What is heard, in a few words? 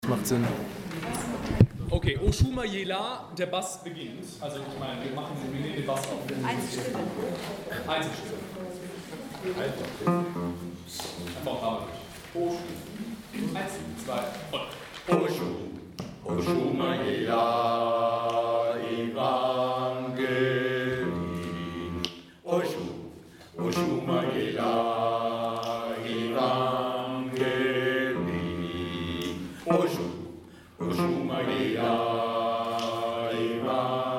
Bass